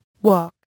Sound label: walk